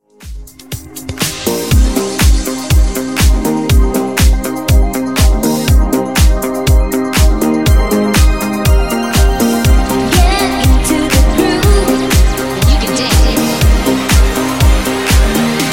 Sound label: Funk Remix Clean 121 Bpm